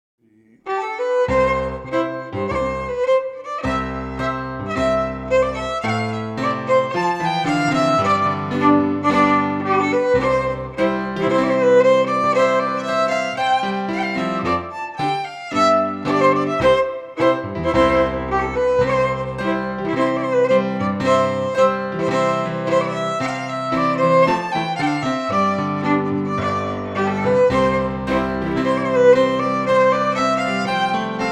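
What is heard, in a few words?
March, Scottish Fiddling; Morrison 1797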